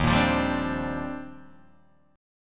D sharp Major 13th D sharp Guitar DADGAD, chord

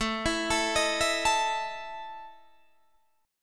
E Major 7th Suspended 4th, A

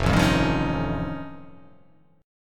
F Augmented 9th F, chord

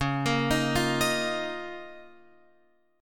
C sharp 6th Add 9th, Guitar, Open F; arpeggiated; x,8,10,10,8,10

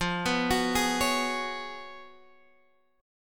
F sharp Major 7th Suspended 2nd Suspended 4th, F

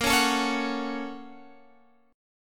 Bb Minor Major 7th Sharp 5th, Guitar, Open G; 20,18,19,18,19,x

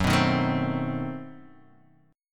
B 7th Flat 9th, guitar

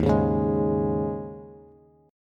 D sharp 7th Suspended 4th, Bass; D#7sus4 strummed; JGuitar

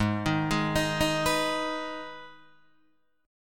G sharp Minor G sharp down Guitar Open D, chord

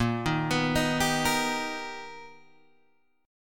B Augmented Major 7th Bb, chord

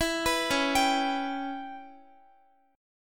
C sharp Minor 7th Flat 5th, Guitar; arpeggiated; C#m7b5; x,x,14,16,13,14

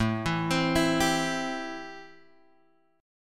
A-Minor 7th A Guitar Open G

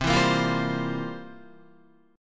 C 11th C down Guitar; C11 strummed

